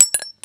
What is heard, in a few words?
ammo casings